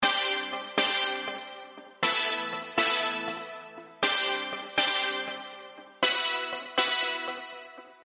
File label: Brass Sample